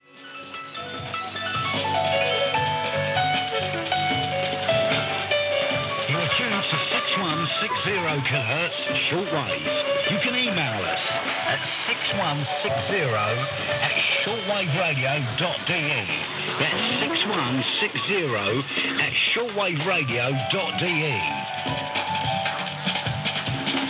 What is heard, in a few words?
AM Shortwave Radio 6160 kHz; Perseus; Schwarzsee